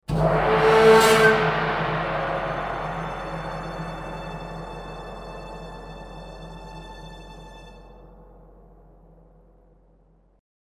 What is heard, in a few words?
Clock Destruction